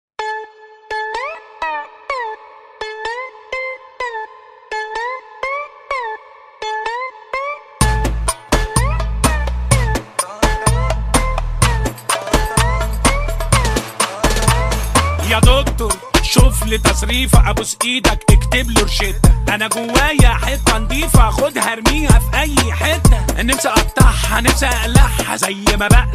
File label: Egyptian Dancing Music; Solo Oriental Mini Kids; ADF 2024